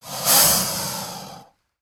Pet Croc Happy; creatures pets; Lego

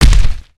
Creature Footstep

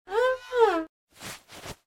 emote adjust hat; emotes

